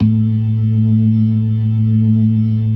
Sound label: LO MANUAL VIB, Organ